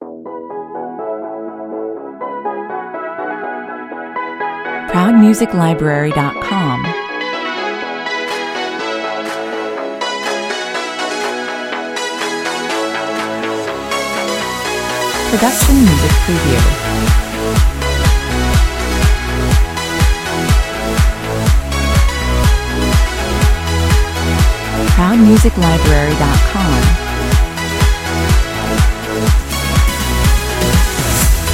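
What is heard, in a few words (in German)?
Eine einfache, fröhliche Melodie mit einem unwiderstehlichen Dance-Pop-Tempo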